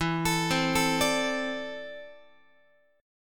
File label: E 7th Suspended 4th Sharp 5th, Guitar; E7sus4#5; arpeggiated; x,12,12,10,13,13